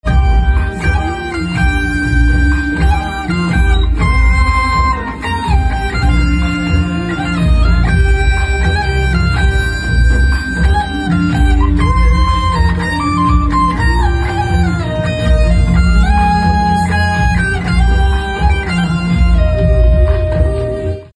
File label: Electric Guitar Instrumental Ringtone